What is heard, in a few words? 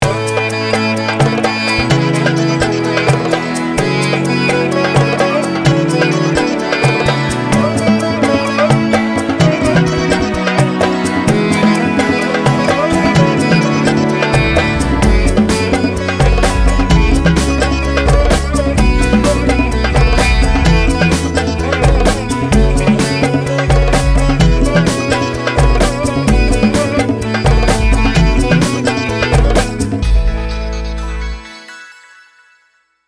Ringtone; RADIOSAI; MANASA BHAJARE GURU CHARANAM